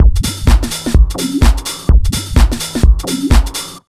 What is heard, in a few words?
127 BEAT, Disco House; USB Soundscan vol.20; Fresh Disco House I; AKAI